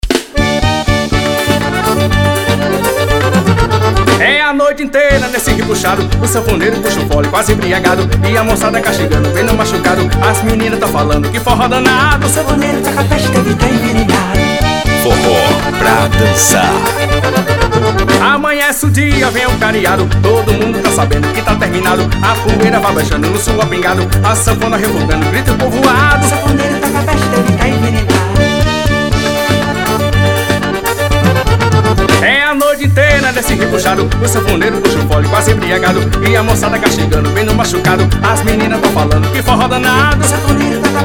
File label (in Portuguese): forro pingado